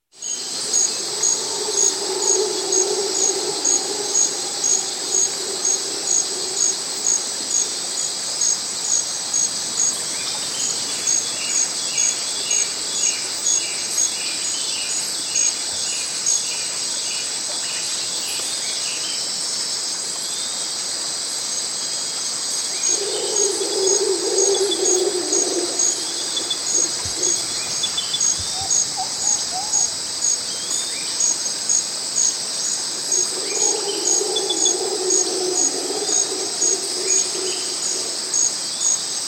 Exotic Rain Forrest; Nature Ambiences

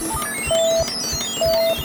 COCKPIT, Space

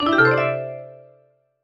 SFX Correcto; trivia crack

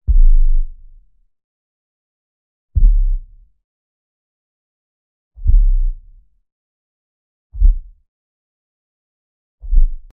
Sub woofer - Deep impact x5; Hollywood Edge Sound Designers Tool Kit

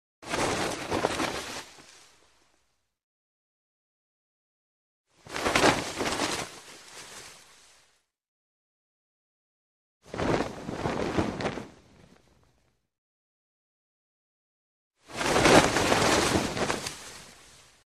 Cloth Flag Flaps; Hollywood Edge Foley Sound Library

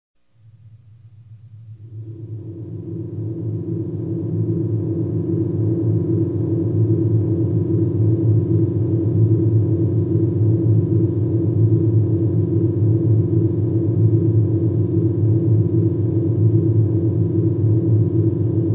synthesizer; SOUND EFFECTS1

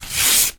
PAPER, TEARING PAPER

